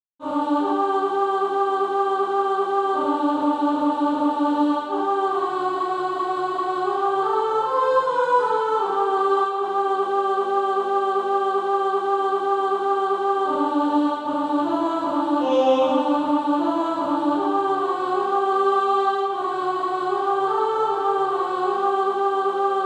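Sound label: alto, Practise Music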